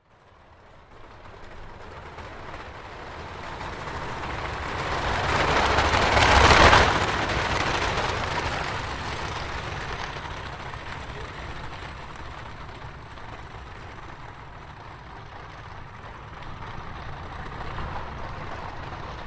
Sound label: Horse Drawn Carriage Fast By; Horses Carriages and Traps; Hollywood Edge Historical Series